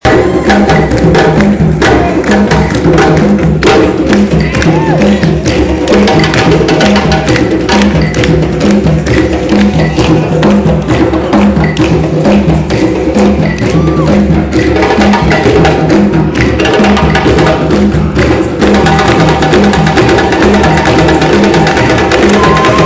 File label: drum dance